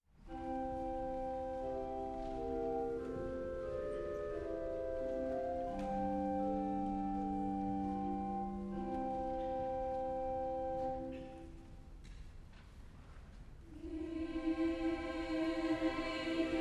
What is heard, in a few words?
Kyrie Bruckner